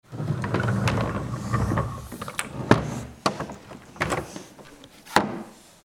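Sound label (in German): mülltonne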